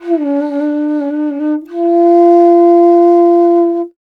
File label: INDIA BASS, IND BASS